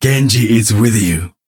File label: battlecry; genji